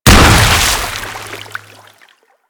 Hammer Impact Water D; Warframe; Weapons

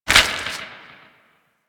Mech; Weapons; Warframe; Tenno; Grimoire

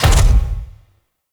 Mech Step; Nechro Mech; Vehicles

